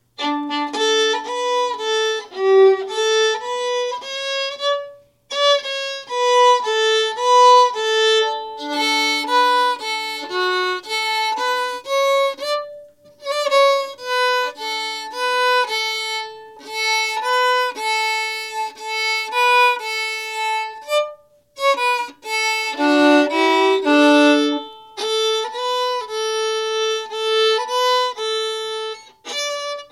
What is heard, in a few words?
Incompetent Strings